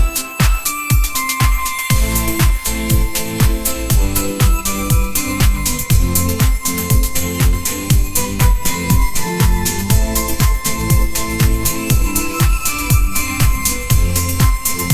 k pop style, ringtones

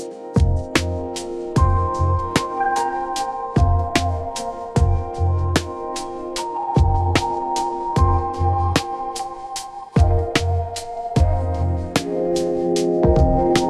lo-fi hip hop

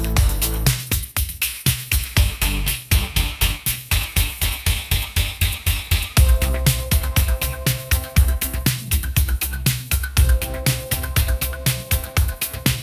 upbeat pop melody; ai

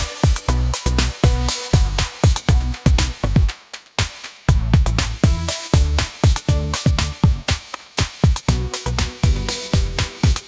shout it out pop groove

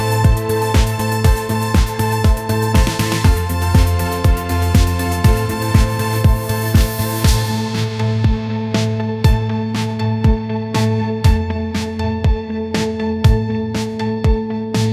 upbeat pop melody, ringtones

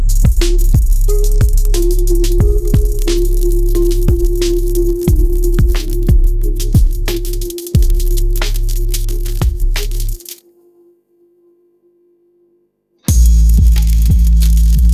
trap ringtone vibes

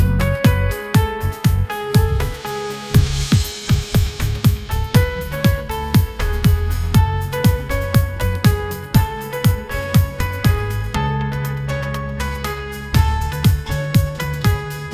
upbeat pop melody

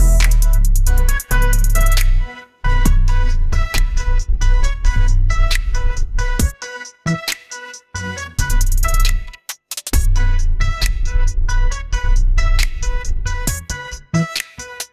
electronic dance music

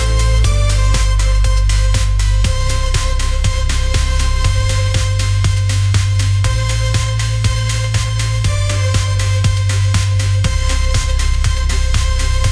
upbeat pop melody

